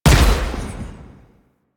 Rail Jack Weapon One Shot; Modular Weapons

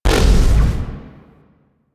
Shotgun Proj Fire; Weapons; DE; Warframe; Tenno; Pod Shotgun

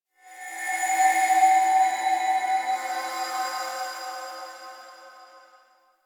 Texture One B, Ambience; Warframe; Rail Jack

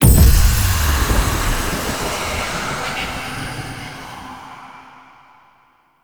Dialog Options Close; Conversation Cinematic; Warframe; Lotus Eaters; Quests

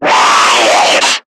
Screamer Pain; Warframe; Lasrian

